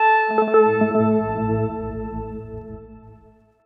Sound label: pluck jumble A; Melodies; One Shots; Slate; AURORA Sample Pack